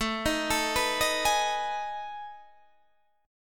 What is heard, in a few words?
B 13th A, chord